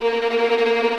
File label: VI TREM F2A-L; AKAI Advance Orchestra Upgrade 97 Vol.1